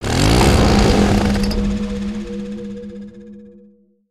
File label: Space Marauder Charge; Valiant; aronwk collection; ndaudio